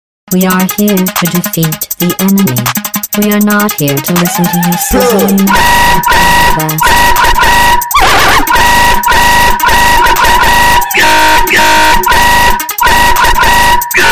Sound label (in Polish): dzwonek